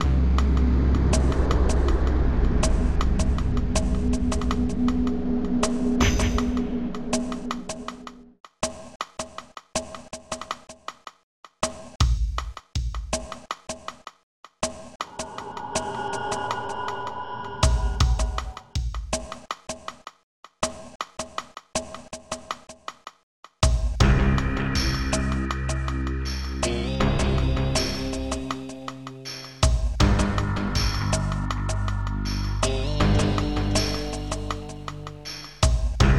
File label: Unreal Suspense; beta unreal